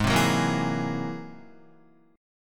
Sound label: Ab Augmented 9th Ab down Guitar, Ab+9 chord; strummed; JGuitar